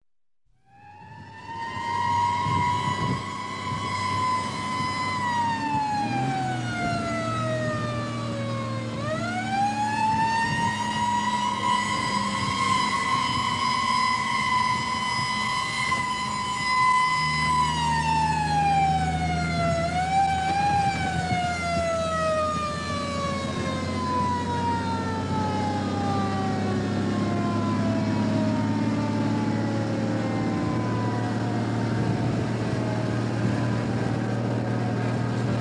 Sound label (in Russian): pozharnaya mashina edet s sirenoj iznutri